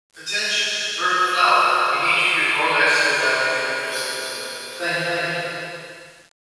rebar intercom, ambience

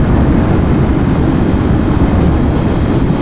engine; rebar

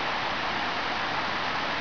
static

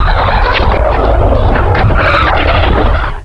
quake; ambience

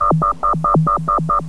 wep wep, ambience

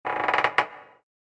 ladder creak

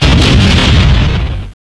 tank mortar; ambience

